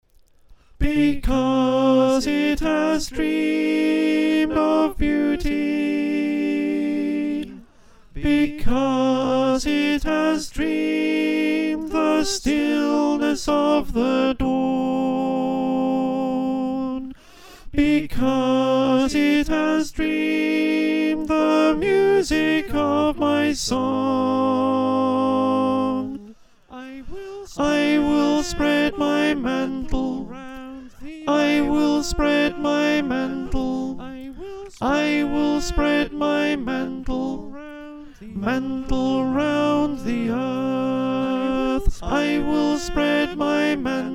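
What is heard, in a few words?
Alto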